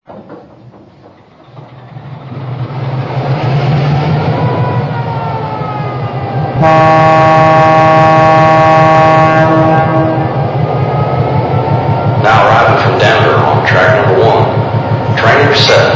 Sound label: EMD F3; DRG